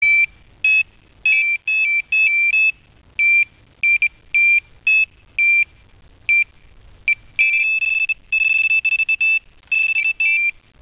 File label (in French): detecteur carpe